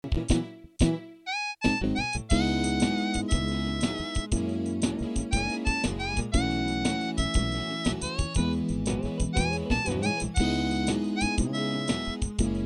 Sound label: harp midi